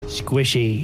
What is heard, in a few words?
zombie; gmod; zombie camp stories garrys mod sandbox funny moments